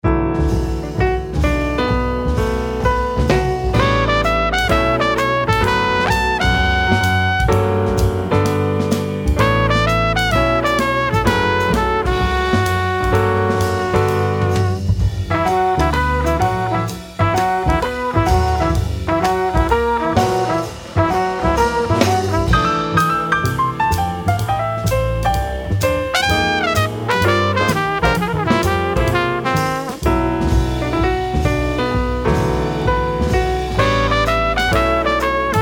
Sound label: jazz